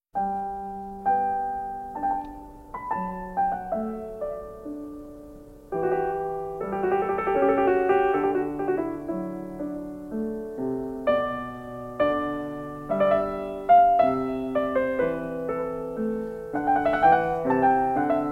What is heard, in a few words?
Goldberg Variations for keyboard, BWV 988- Aria; Klassika; Bach, Johann Sebastian